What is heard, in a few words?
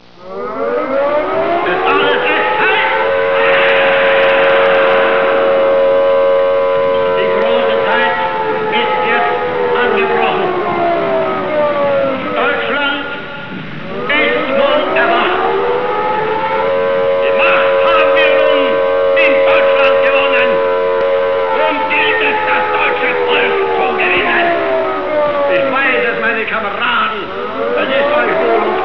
air raid loop, ambience; hitler